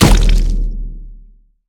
impact; smite; god scylla

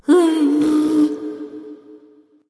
tongue light; smite; voicepack; hel

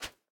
idle swing; smite; raijin

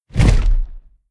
swing earthy; Smite; Cabrakan

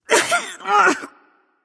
clear throat light; smite; voicepack; hel

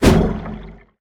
swing trunk; smite; god ganesha skin11

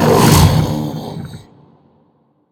growl; smite; god sobek

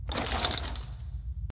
lobby landing armor; smite; chiron

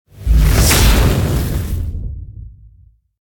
death explosion; smite

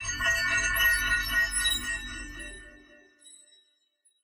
astrolabe spin; smite; god atlas

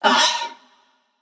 ugh; smite; nemesis

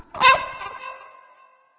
defeat; bastet

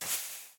sandcastle dig; smite; god geb skin7

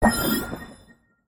ally cleanse; smite; god atlas

